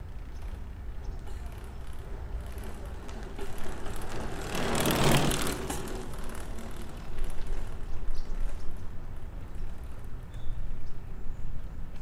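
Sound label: Mountain Bike By Gear Noise, bicycles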